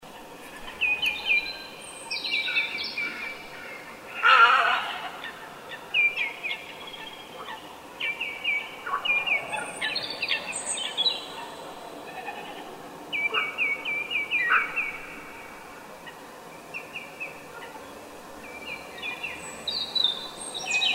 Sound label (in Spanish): Aves de humedal; estuario sup oka